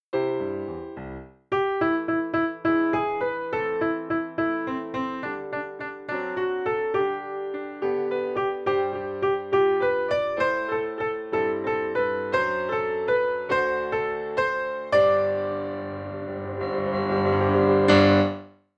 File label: Melody